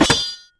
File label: Shield click; AoM-ARTofMyth